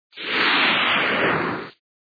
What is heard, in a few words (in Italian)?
armi